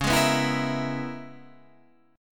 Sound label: Db Minor 13th, chord; Dbm13 strummed; 9,11,9,9,11,12; JGuitar